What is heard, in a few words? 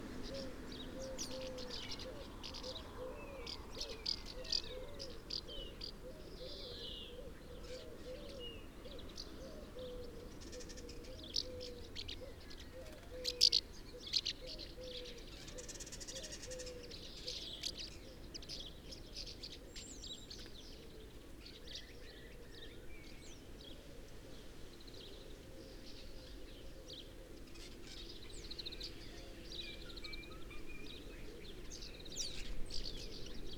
Common House Martin Delichon urbicum